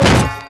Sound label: Impact; Ed Edd n Eddy; Adromulus Pack